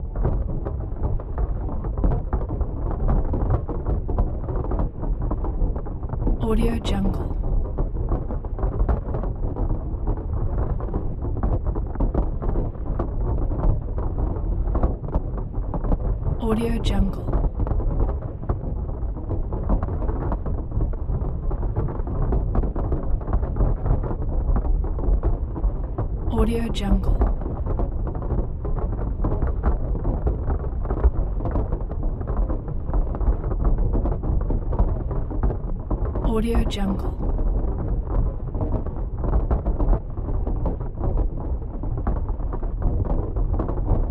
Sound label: scifi spaceship hull mechanical stress loop